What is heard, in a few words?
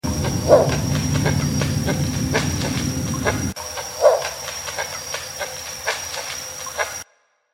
Yellow Crowned Night Heron